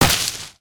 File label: Generic On Hit With Effect; creatures organic